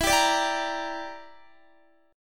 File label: E Minor 7th Flat 5th E, Guitar; Em7b5; strummed